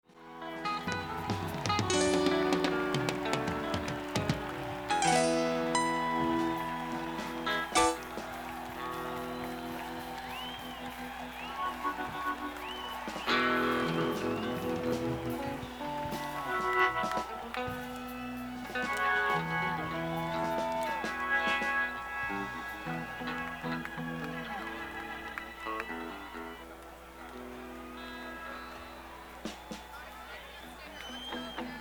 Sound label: Tuning